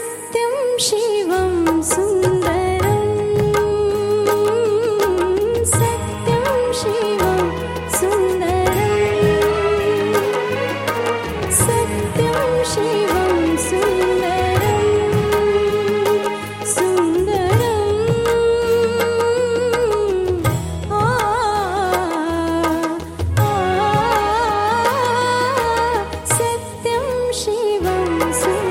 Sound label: FEMALE VERSION RINGTONE